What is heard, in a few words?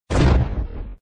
boom; Ben 10 - Hero Time Game; ThrillGames